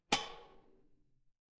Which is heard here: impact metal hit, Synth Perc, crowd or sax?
impact metal hit